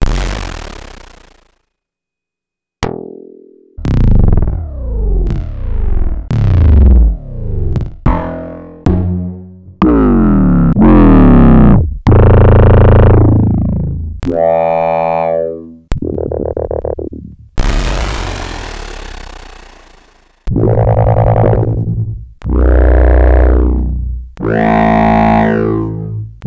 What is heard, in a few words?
Bass Weird Loops